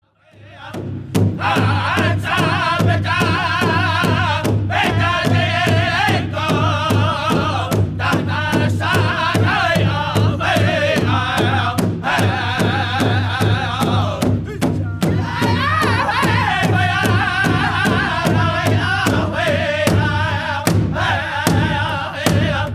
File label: Men's Traditional